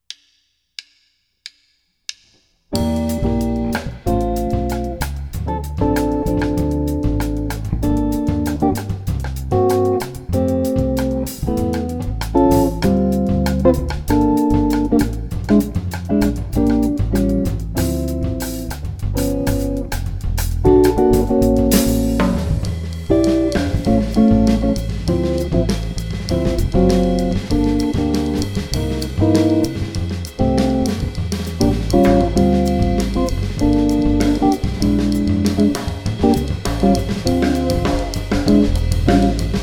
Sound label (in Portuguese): Baião